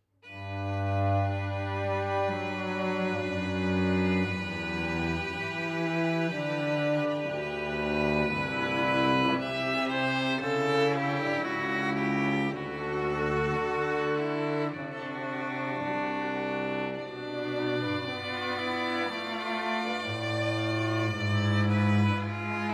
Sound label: Air on a G String